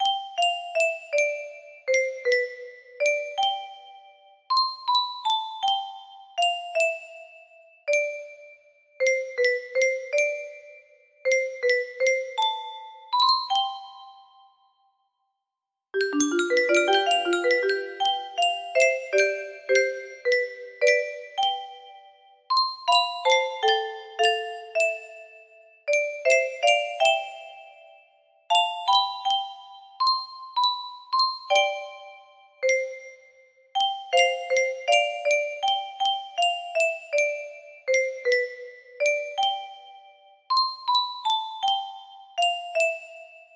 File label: lullaby, melody